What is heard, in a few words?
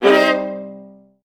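violas C3; Best Service; Peter Siedlaczek's Advanced Orchestra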